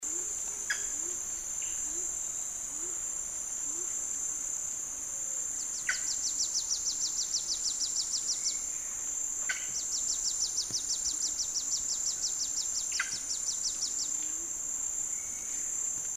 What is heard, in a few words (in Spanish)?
sonidos canto ave del paraiso metalica